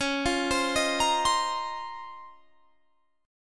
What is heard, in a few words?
Db Major 13th; arpeggiated